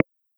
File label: Affirmative Mono